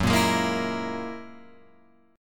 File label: Gb 9th Gb down Guitar Open F; strummed; JGuitar